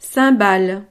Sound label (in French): cymbale